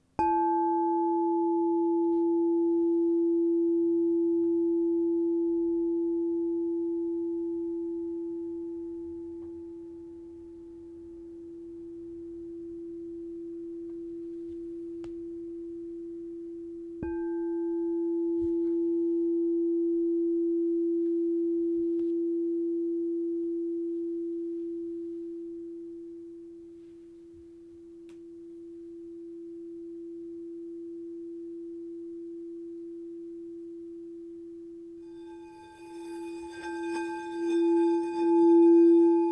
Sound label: frosted 8inch E +35, crystal bowl